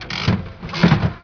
sl lever; cowboy casino

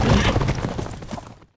horse

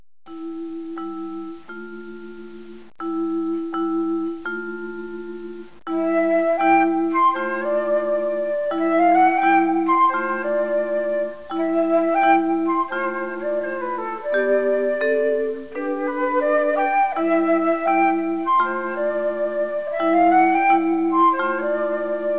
FLUTE; 8BIT

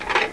move lever; Maurice's Toaster

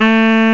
SAX; The Atari Compendium (Toad Computers) (1994); music; synths

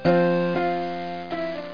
Sea Cliff Asterisk; mer

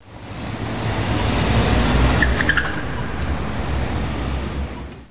amb

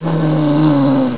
zombie idle; Quake

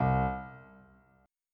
buzz

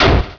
Hit

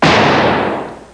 bang

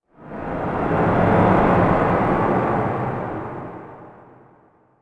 city, zones; Zoom3City

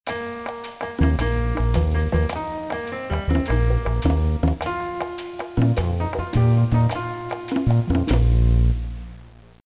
Anthem

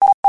Sound Beep

